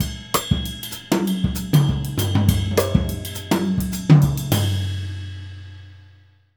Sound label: Jazzistic; Univers Sons